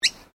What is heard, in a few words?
Bird Miner Noisy Call Single, Birds in Queensland; Animals